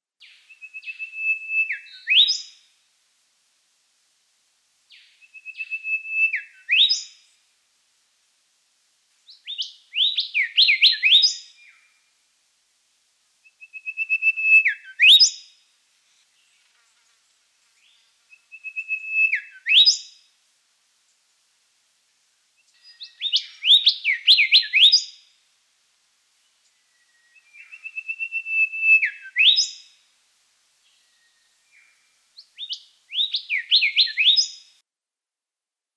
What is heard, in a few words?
golden whistler; ventemans track; natural environment